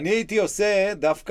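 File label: Contrabass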